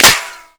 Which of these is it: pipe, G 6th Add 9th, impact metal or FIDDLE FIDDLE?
impact metal